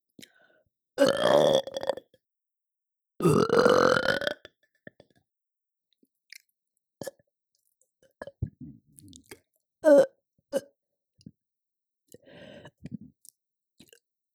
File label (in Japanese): ゲップオンリー, ASMR